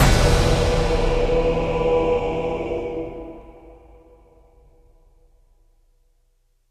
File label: Death Stinger; runic rampage